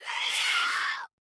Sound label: Cry